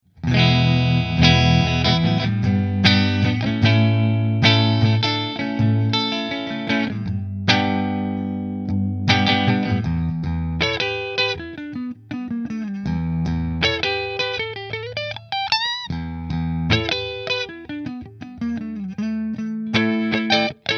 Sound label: Nola JB14 Middle Split Fender, guitars